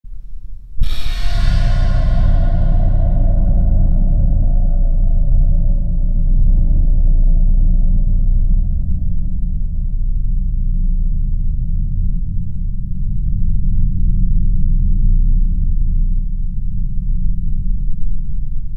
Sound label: sprung bell